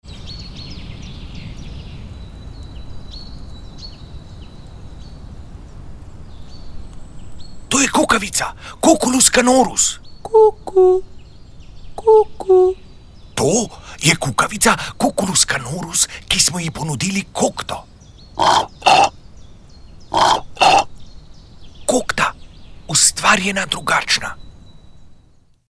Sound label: cucuclus canorus